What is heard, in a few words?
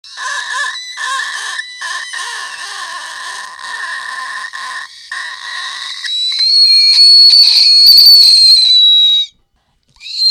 tiger thrush